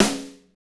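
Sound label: snare, Motown; Northstar - Drumscapes Roland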